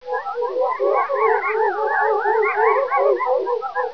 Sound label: sorrow unlooped, ambience; xenome